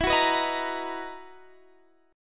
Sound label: B Major 7th E; strummed